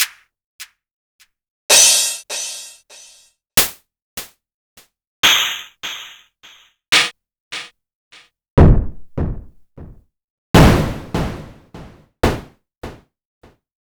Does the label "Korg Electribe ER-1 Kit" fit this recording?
Yes